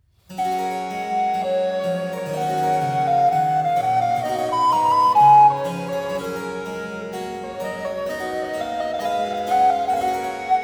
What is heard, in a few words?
Sinfonia G-Dur Andante (Allemanda)